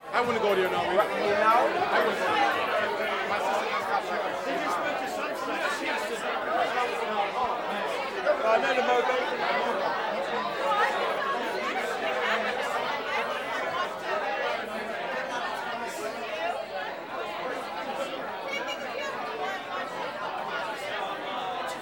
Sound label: CROWD bar; party People